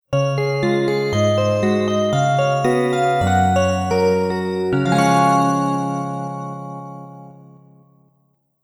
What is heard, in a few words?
johetsu chime